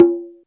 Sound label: CONGA HI; PERCUSSION; Back In Time Records - Big Bang